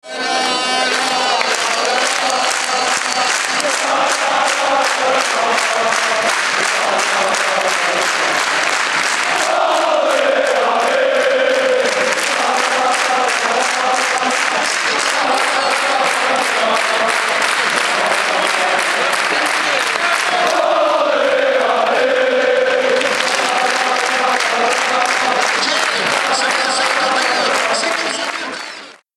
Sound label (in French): La la la la lala Bordelais allez